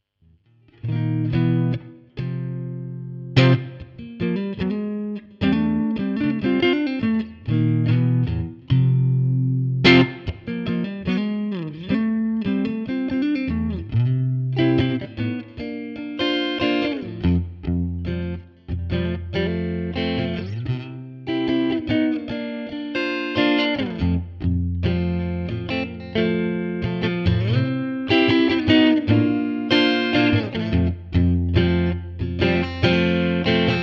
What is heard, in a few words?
Clean Middle Fender Bassman 4x10; three Fedora pickups (6K each)